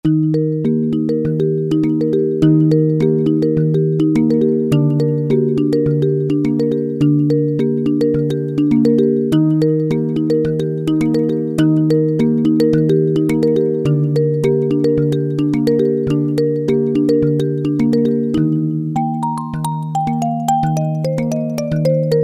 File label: Marimbell; Roland PN-D50-04